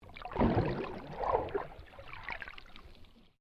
Water Waves On Rocks Submerged Small, Waves recorded underwater near manly beach; Ocean Sound Effects; Ocean (Sound Effects:Nature)